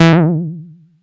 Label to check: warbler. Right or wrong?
Wrong